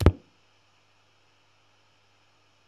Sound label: AMP NOISE; 1000fx